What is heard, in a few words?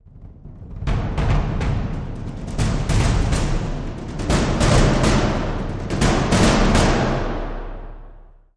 Tom Buildup